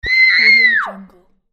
child scream

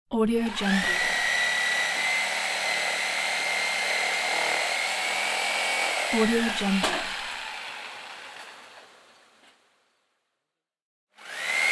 chainsaw

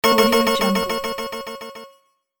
videogame hit ding repeat

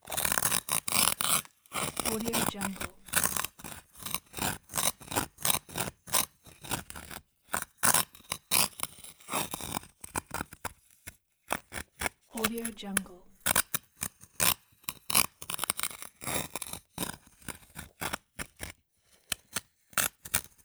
ice scraping

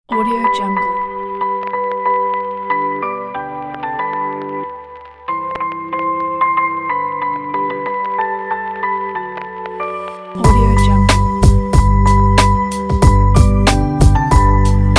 jingle bells boom bap hiphop

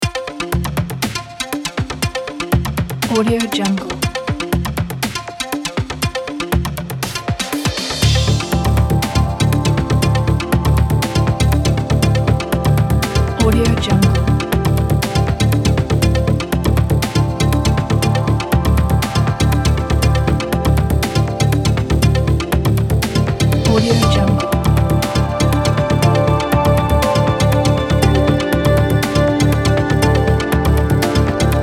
virtual humanity technological life

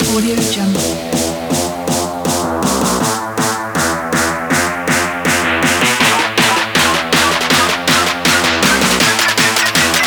action trap

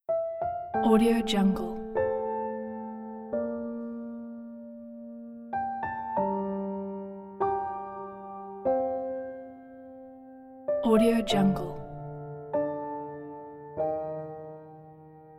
cinematic emotional piano strings

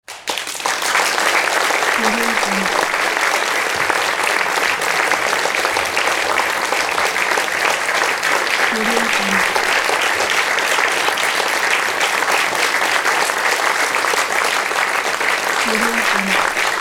simple audience applause

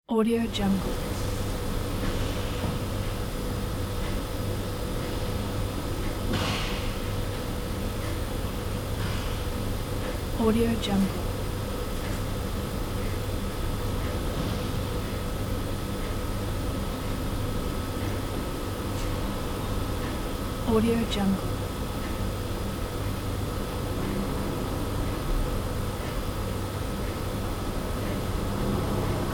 apartment ambience

